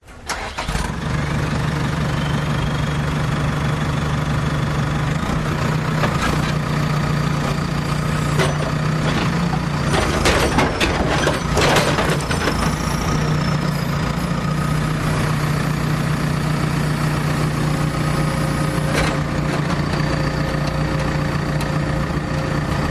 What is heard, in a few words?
bulldozer engine starting loading debris into a truck then shutting off; close persp; Sound Ex Machina